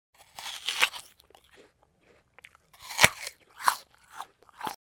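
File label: apple crunch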